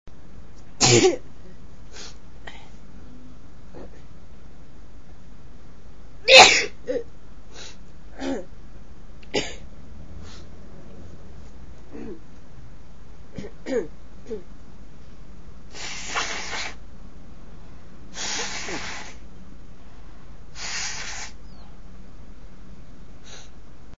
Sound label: couple of sneezes, female